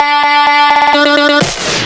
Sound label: filler tool 128 D; Anthemic